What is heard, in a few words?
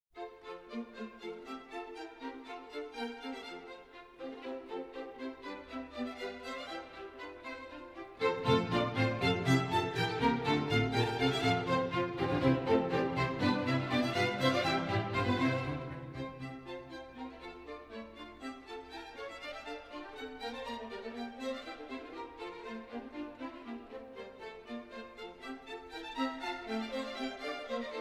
bourree, water music